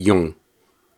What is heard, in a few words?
Syllables and Imaginary Words, Viking Vocal, Hard